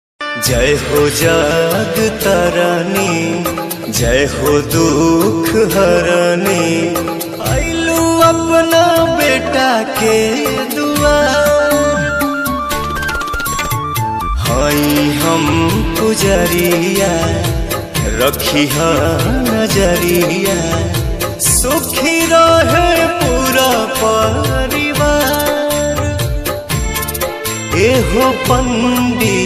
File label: bhujpuri devotional ringtone